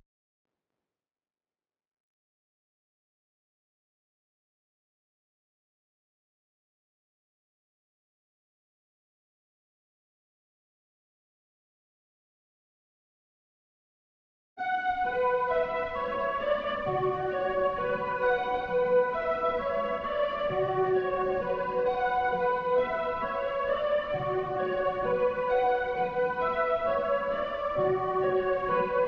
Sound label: 132 BPM B Min Synth; Melody; Trap; Loop Stems; Cymatics; 2022 Melody Collection - Trap Pt 1; Atlanta